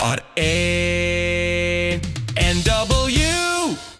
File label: jingle